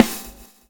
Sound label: SWING